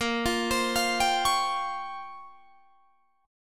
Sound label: A sharp Minor 6th Add 9th A sharp down Guitar Drop D, chord